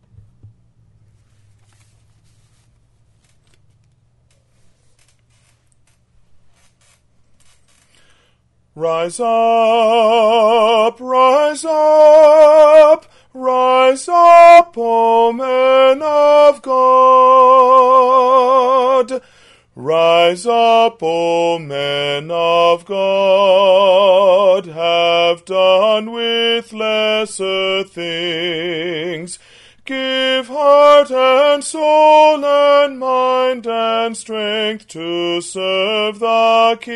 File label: - Tenor 1; Rise Up, O Men of God